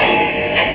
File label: Pile Driver; Odyssey Demo